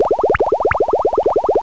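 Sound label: Bubbles Ascending; Wayzata Technology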